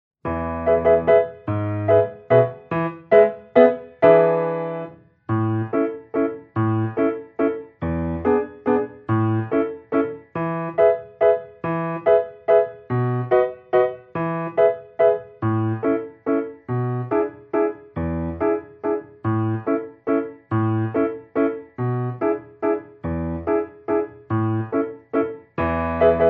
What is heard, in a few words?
mazurka; uebeversion